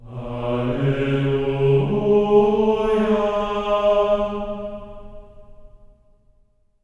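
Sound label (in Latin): alleluia C#4; Spectrasonics; Symphony of Voices; GR ALLELU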